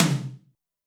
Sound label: tom, Zoom MRT-3B